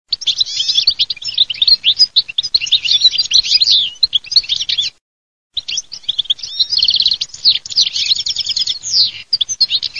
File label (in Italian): CARDEL MUDA